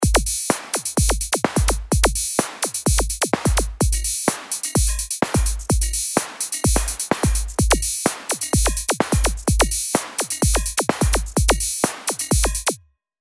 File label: Dance; RP Punch Dance